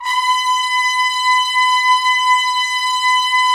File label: Trumpets; Roland - LA Composer vol. 4